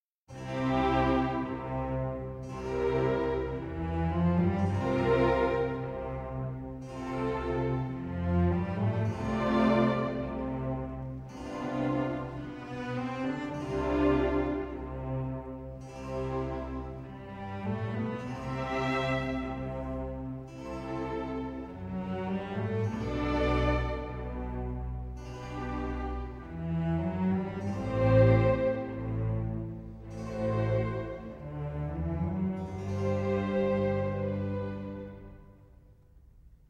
Violin Concerto No. 1 in A Minor II. Andante; Bach, JS; BWV 1041

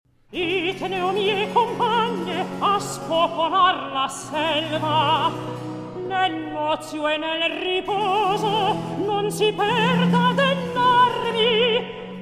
Recitativo; Atto I; Antiope

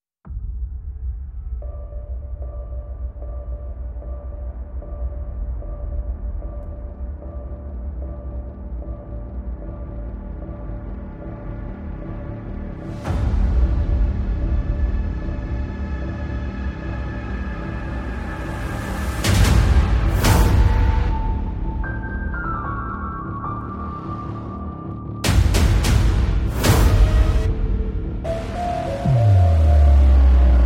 Toccata and Fugue in D Minor

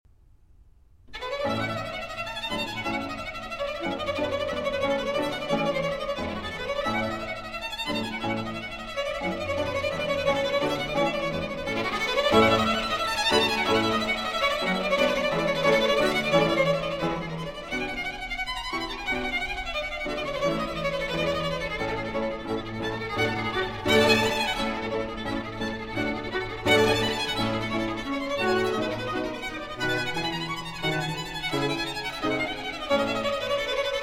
Quartet in A Major, Finale, Allegro Vivace

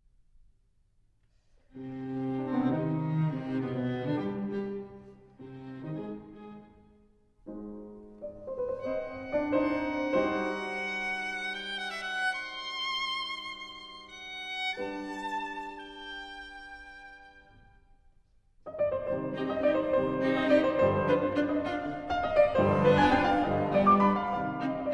Piano Trio No. 3 in C Minor I. Allegro Con Brio

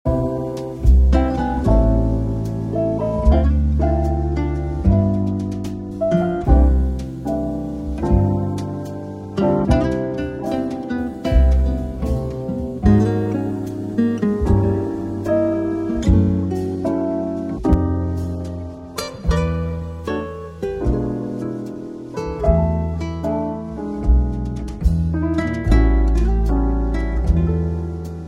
Soft Jazz

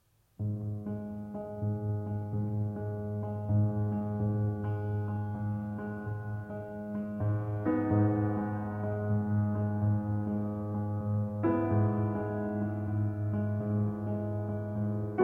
Fantasia on Bach (on Steinway Grand

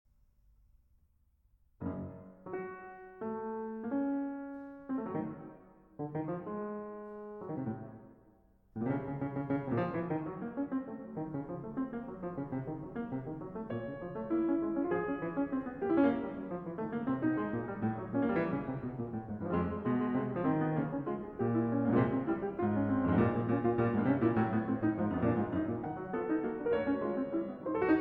Piano Sonata in B Minor Allegro energico

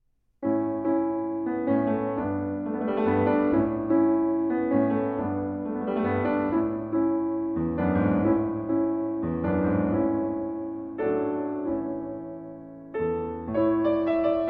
Solo Piano

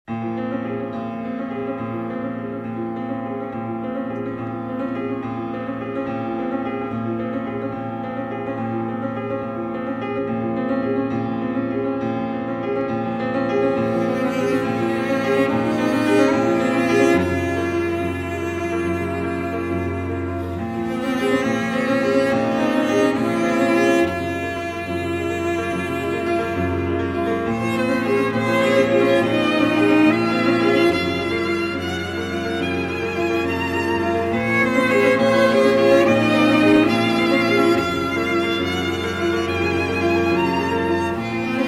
Piano Trio; No. 1

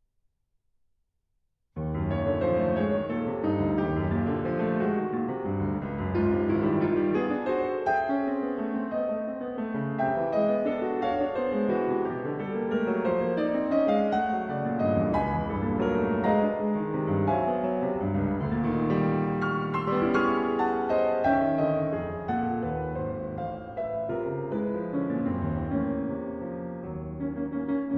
Piano Suite, Serenade. Allegro moderato

